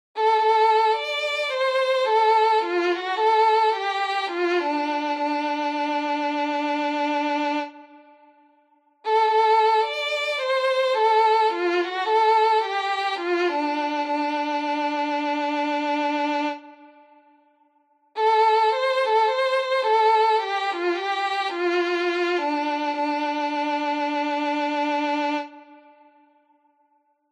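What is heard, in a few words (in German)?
Violine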